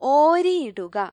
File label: howl; gcompris-data